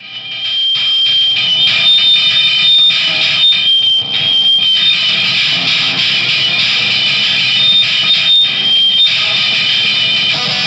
PAINFULL FDBK, Synth FX Misc

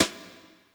Brush Tap, Yamaha PSR E305

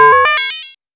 MOOG HAT; AMG - Vince Clarke Lucky Bastard

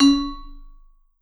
Vibes; Sampleheads; Dave Samuels; Marimba & Vibes

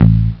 m1 pick bass, Amiga Soundtracker Sample Packs; ST-XX Amiga Soundtracker Sample Packs

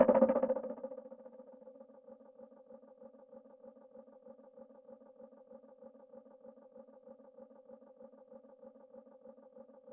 Drum Loop, Yamaha DTXpress

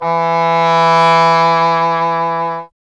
E. Horn

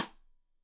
Side Stick Stereo; Deeply Sampled Drums

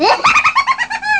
wahahaha; ST-XX Amiga Soundtracker Sample Packs

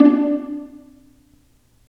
STR P, Roland String Master Series, Vlas Pizz

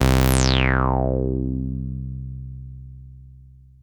303-Style single hits; FM 57 - The Ultimate Sample Collection (1997)

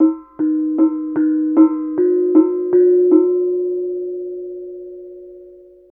KENONG; Spectrasonics; Heart of Asia; KENONG PH

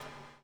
BUBL, Backbeat; Spectrasonics - Backbeat

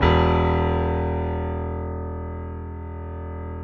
PIA PRO, Roland

